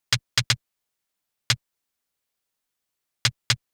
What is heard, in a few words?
Closed HH; hydrogen